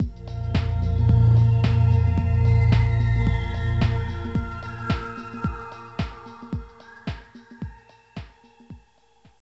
Warning; Moujiya; arcade

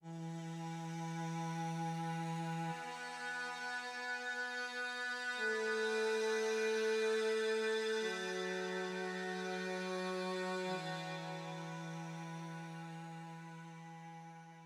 Cello High; one dog story

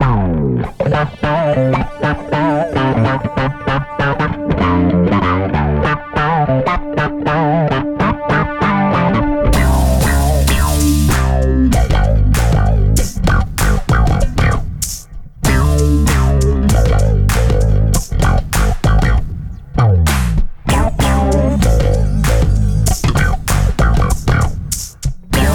Dark Level Funk; ToeJam and Earl: Back in the Groove

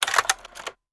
Movie slide; Yu-Gi-Oh! Master Duel

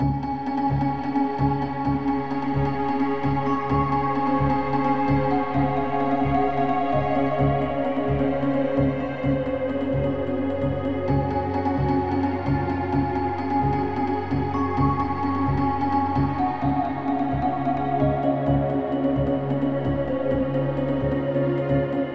Newsflash

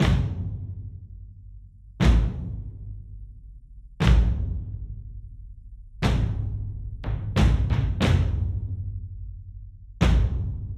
Drums

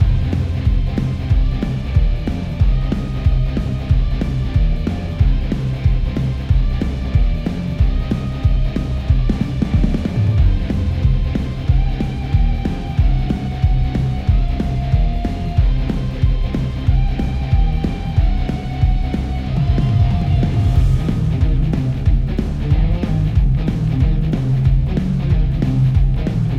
Boss Theme Bass Only; DeadBird

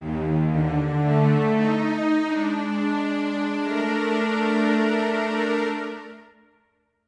strings; undead overlord linux macos windows gamerip 2014

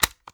Button

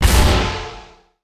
Robot Hit

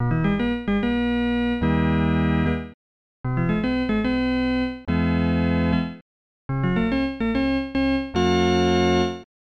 Organ Music

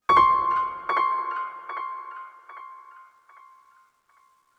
One shot Piano; zombie army 4 dead war; ps4 stadia switch windows xbox one

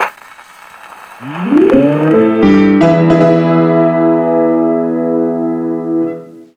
WILD WEST STING CANCAN

